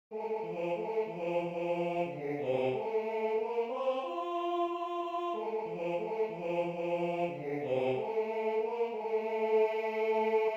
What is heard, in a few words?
Tenor I; Little David Play On Your Harp